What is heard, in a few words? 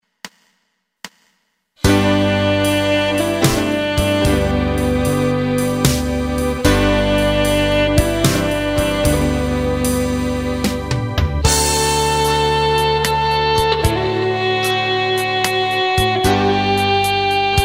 Silent Night Soft Reed